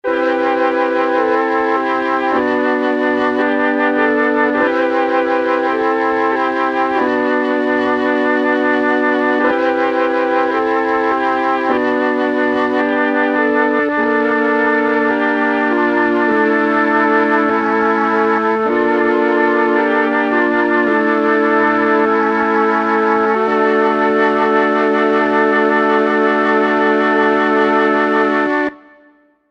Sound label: flute